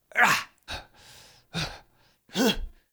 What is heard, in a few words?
Attacks Vocals Male; Bromius; Wazard Attacks; Envoy